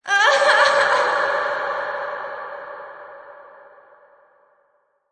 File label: Female Ghost Whine, Eerie, spooky, ghostly female voice, crying, com; Horror; whining, muttering; Lynne Music